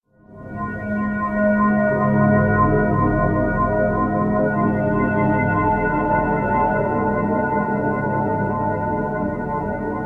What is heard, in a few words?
Jingle Level start; neighbours from hell portal through doors